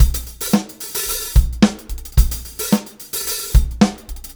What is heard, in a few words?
Live Beats, R&B Drums